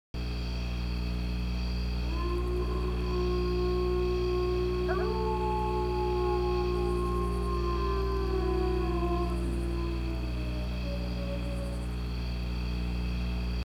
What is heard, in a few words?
wolf song